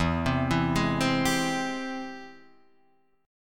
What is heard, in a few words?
G sharp Augmented Major 7th, chord